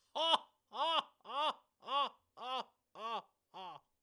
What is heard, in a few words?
kabuki haha